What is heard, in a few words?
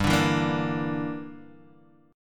G Minor 6th, Guitar Standard; Gm6 strummed; JGuitar